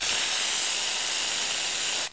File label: Spray Can; Florida State Football